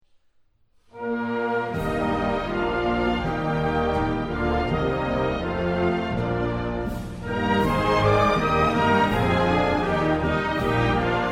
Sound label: national anthem iceland